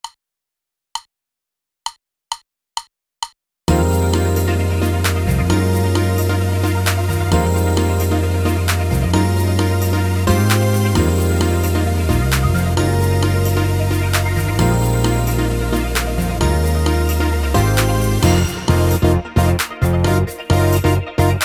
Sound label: Power Drive drumless